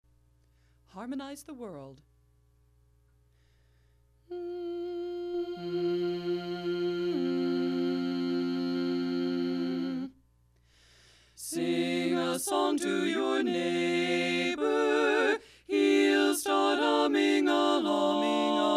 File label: Harmonize tenor miss